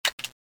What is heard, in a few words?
reload loop, weapons; blockas1